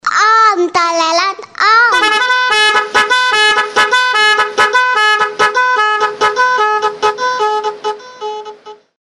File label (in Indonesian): nada dering om telolet om